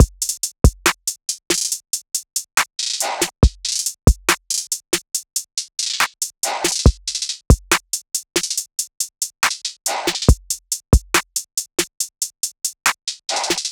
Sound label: beat loop full 140